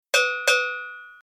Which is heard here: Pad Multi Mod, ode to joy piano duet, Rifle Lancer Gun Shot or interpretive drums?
interpretive drums